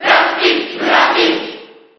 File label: Cheer JP; Black Pit; SSB4